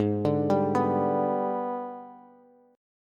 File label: Ab Minor Major 7th Flat 5th chord, Bass; arpeggiated; JGuitar